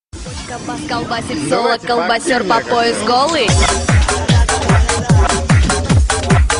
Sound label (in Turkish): zil sesi